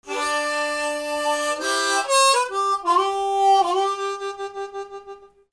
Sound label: MUSICAL